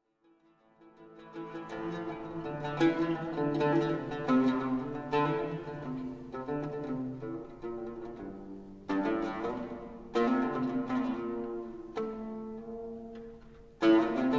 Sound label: oud solo; ausschnitt